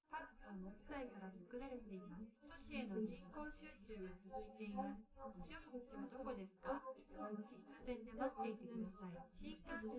female live rec 250ms 1m; SiSEC11; underdetermined

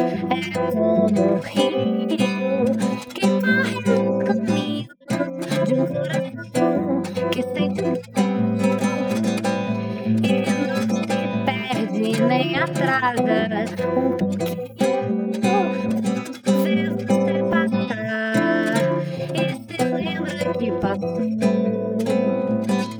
guitar

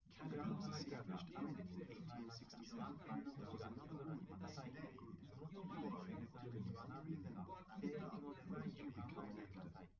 male live rec 1m sim; SASSEC07

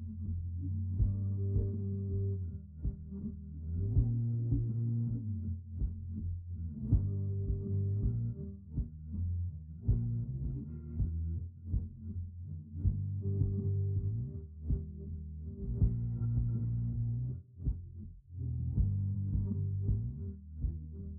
bass; SiSEC11

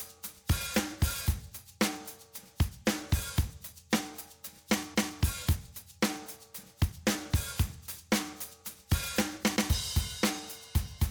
drums; SiSEC11

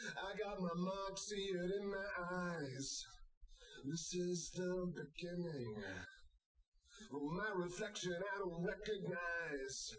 wdrums liverec 250ms 1m

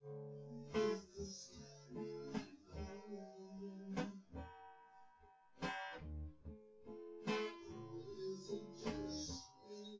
no drums, synth conv, 250ms, 1m; SiSEC10; underdetermined

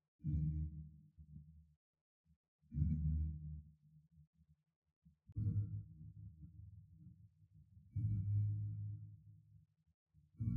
Bass; ICASSP11

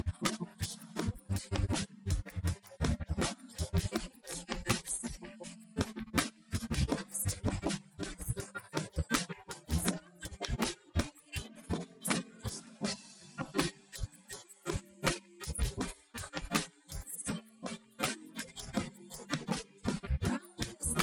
drums; SiSEC11

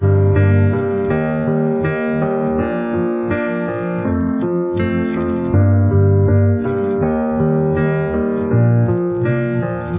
no drums inst src; SiSEC11; underdetermined

